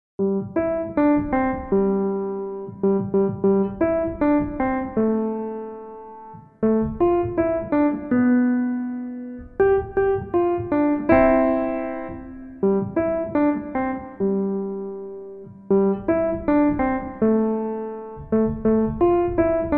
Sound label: Jingle Bells